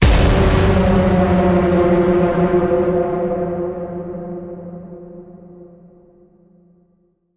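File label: creature; Rend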